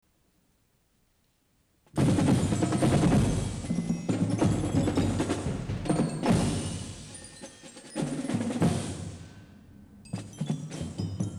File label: Percussion Solo